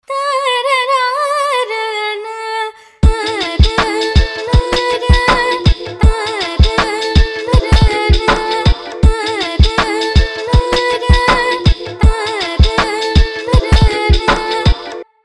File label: short chill hop